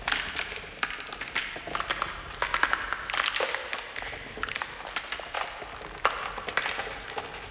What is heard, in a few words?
puppet move horror